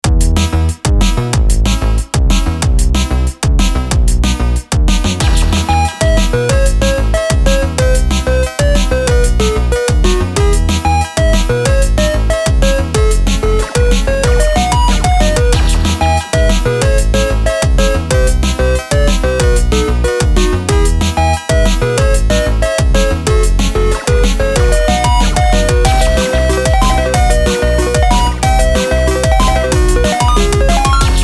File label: music